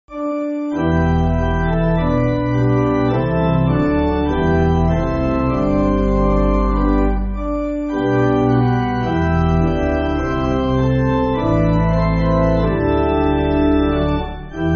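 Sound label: Folksong, Pipe Organ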